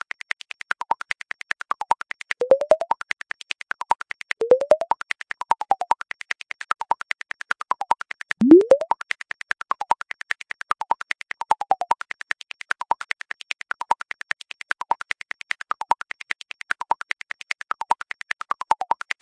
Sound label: POPPER; Studies in MASS